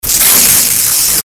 distorted scraping noises

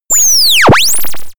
sweep activation

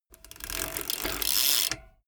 bmx bike pedal once freewheel brake short

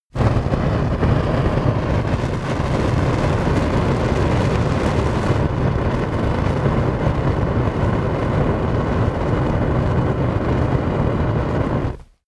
fire burst

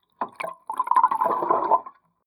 flowing submerged small burbling gutter

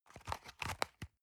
paper box small handle medium

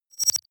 deny click

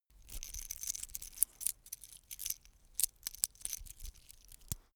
metal watchstrap rattle

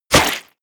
hit blood heavy

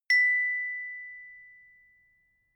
notification glockenspiel single

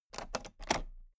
toolbox latch open heavy

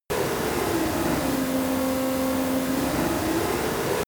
crane winch low

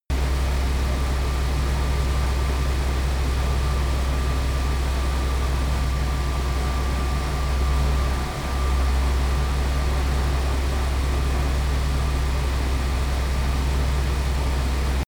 artillery missile truck move loop

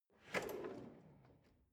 drawer large draw

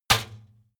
trash basket metal hit hard

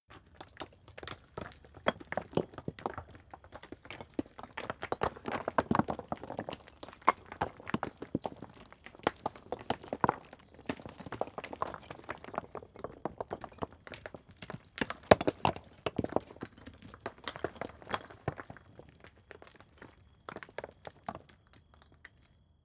stone medium landslide long

